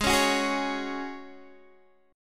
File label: Ab 7th Suspended 4th, down, Guitar, Drop D